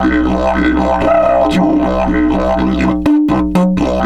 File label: 120, Didgeridoo